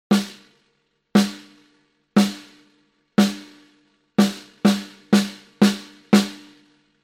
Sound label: snare off